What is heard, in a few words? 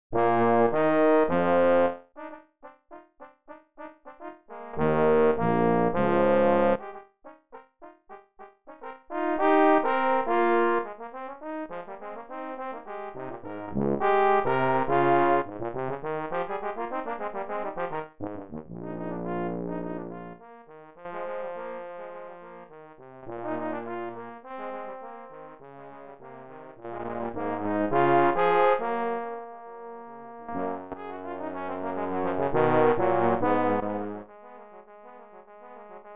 Dancing Fanfare 2 trombones, A festive short fanfare for brass